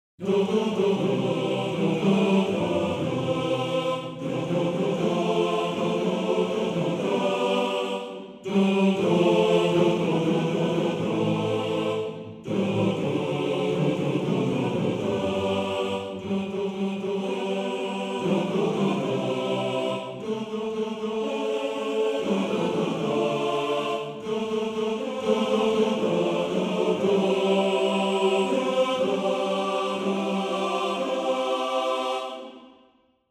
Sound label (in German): Chor